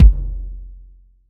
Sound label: puffy kick single shot f key; kick drum samples